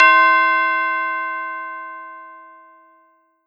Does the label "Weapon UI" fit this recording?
No